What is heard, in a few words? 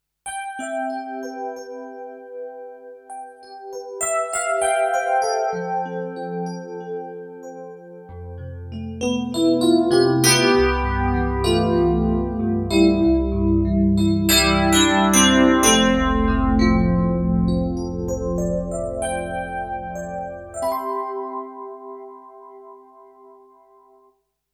Yamaha CLP575 DX EPiano